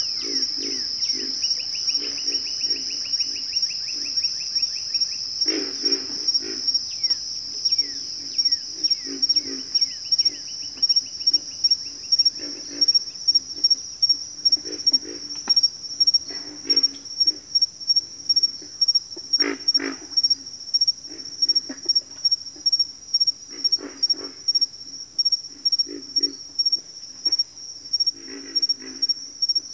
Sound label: pig frogs, bayou